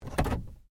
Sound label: Stem Control, car; Hyundai Accent 2008; Hyundai hire car; Vehicles